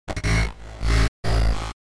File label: Glitch accent broken robot